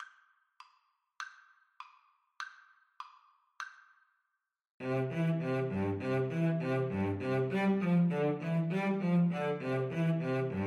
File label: Cello Duet